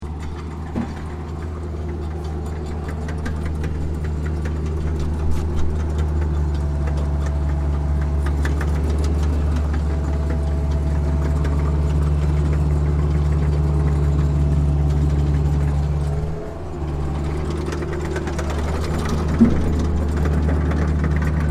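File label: Heavy Vehicle Tracks Like Military Tank; Long sound with various maneuvering, driving around in a military ta; Machinery Sound Effects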